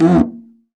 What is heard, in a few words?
Cuica Down, Perc; LA Perc; Akai MPC-500